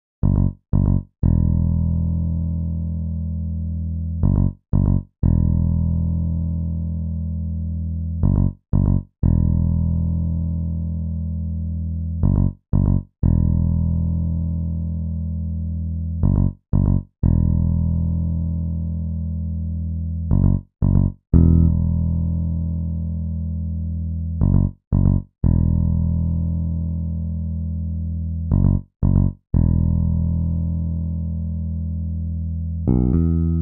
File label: Bass